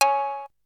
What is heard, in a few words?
Cowbell, Drums; ZERO G - Datafile DISC 3